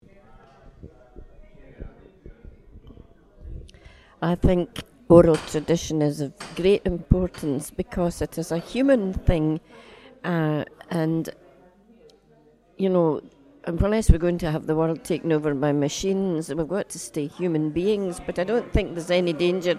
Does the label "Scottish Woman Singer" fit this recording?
Yes